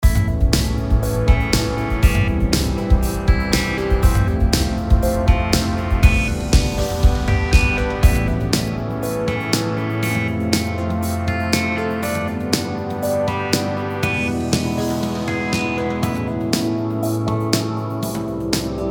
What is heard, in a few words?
Accord Loop, Transient; AtomicTransient